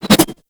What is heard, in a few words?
Tonfa Parry Whoosh; Warframe; Tenno